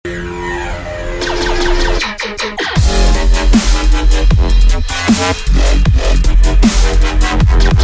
8-bit

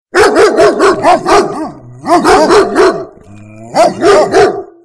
Bad Dog

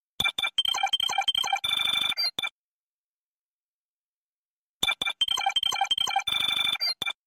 Text Alert

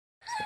Squeak; Bolt Mutt

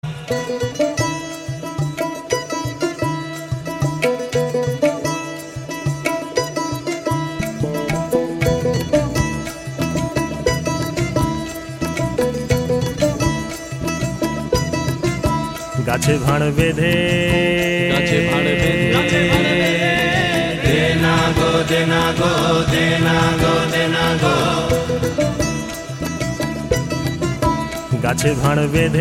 Bengali Folk

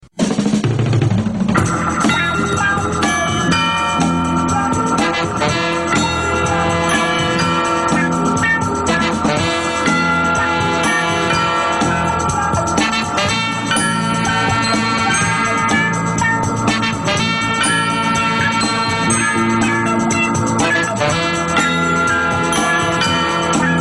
Chimes; Sesame Street

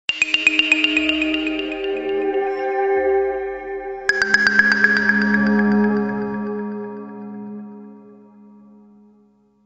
Nokia Lumina

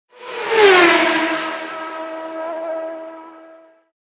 Car Racing

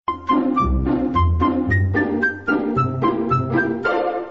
Cartoon Melody

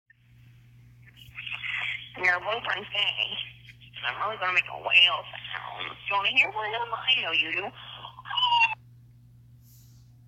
Whale sounds

